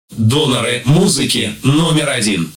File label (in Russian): Джингл